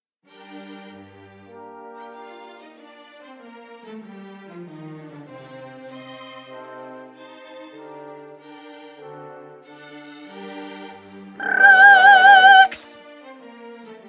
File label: Rex tremendae Soprano; 2020 Mozart Requiem